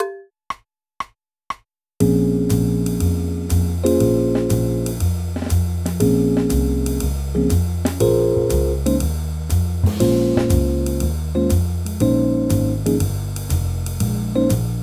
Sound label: C Minor Play Along